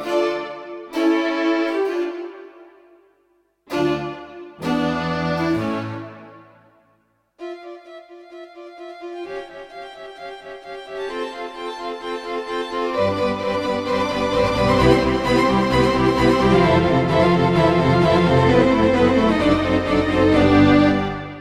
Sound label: violin; bhop